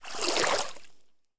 water in, footsteps

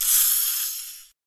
die, aliens; bloodspider

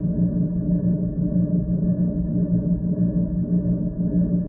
alien machinery

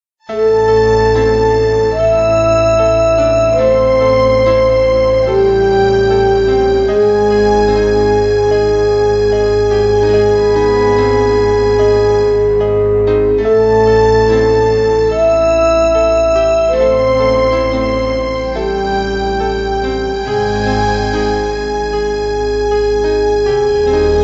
casket; stendhal

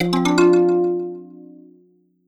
stat up

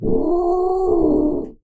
giant rat growl

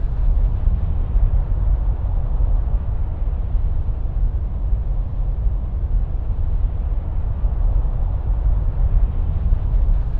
alien atmo

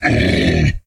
hurt, aliens; ortnok

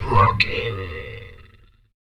die, aliens; shevaar

